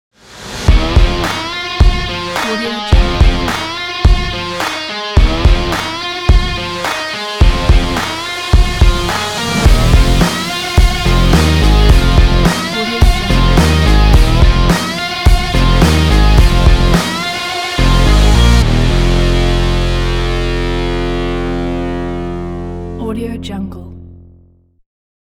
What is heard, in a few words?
rock opener ident